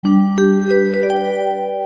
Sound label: Cool Sms Tone